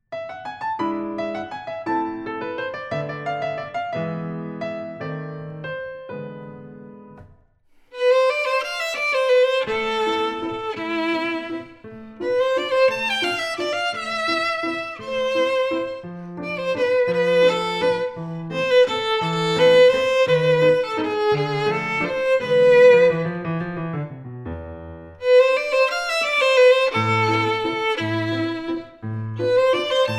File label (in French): Valse manège violon et piano; IUAV; musique instrumentale